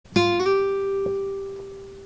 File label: slide